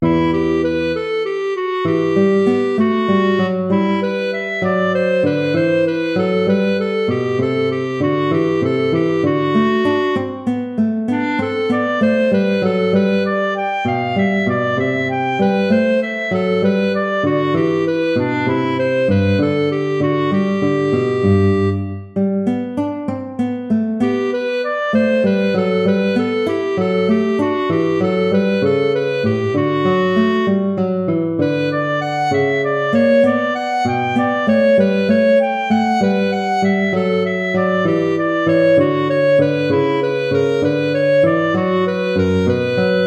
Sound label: gigue